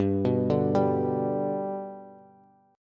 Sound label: G Major G down Bass; G arpeggiated; JGuitar